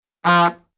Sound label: honk take